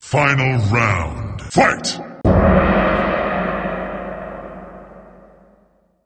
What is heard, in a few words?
round final fight gong